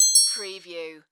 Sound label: Multimedia Chime